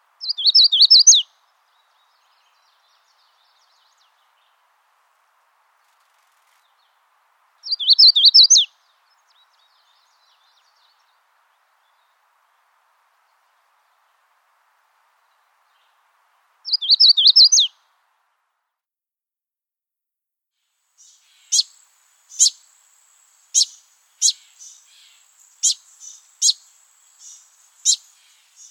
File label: Magnolia Warbler